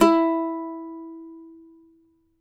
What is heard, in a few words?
CAVAQUINHO E, Guitars